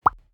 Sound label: Pop